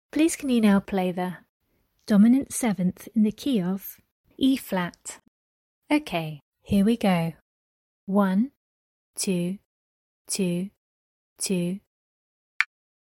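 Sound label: dominant 7th in the key of E flat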